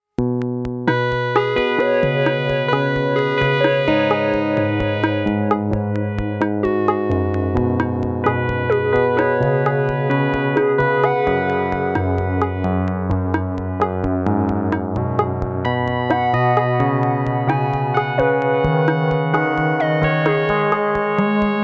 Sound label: Lullaby